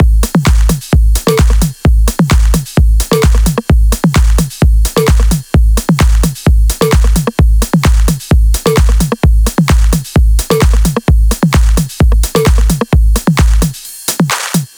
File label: 130BPM Kit; Vengeance Samples; Pressure 2 Kit